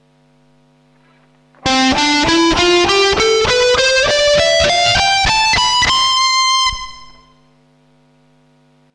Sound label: reverb, guitar effects